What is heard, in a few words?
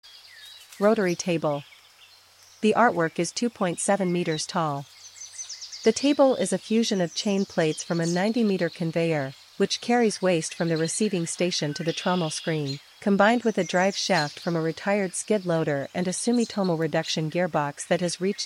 ROTARY TABLE